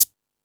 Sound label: Beat Box, E-MU Pro-Cussion